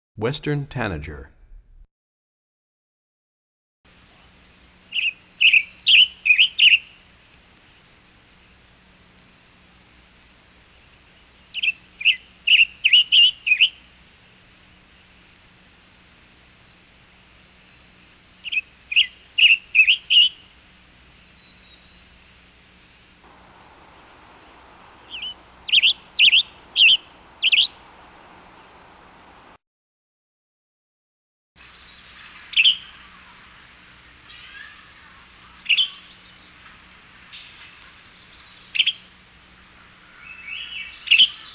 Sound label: Western Tanager, Bird Songs; Animals